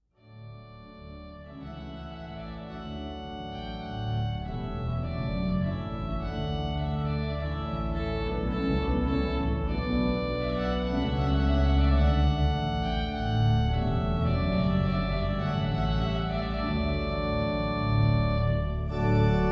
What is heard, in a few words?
trumpet voluntary; clarke